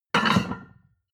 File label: plate on plate place slide hard